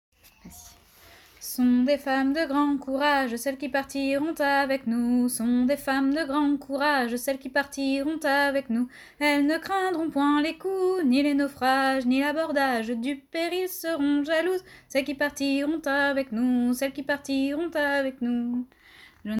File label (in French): Voix lead